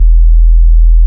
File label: BASS, Tekno Industrial; East West